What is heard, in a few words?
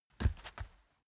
step barefoot wood plank scuff; Footsteps; schritte